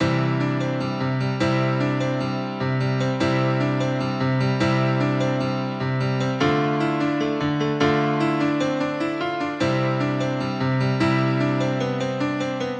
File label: Happy Piano Theme